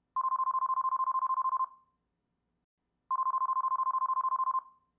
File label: telephone digital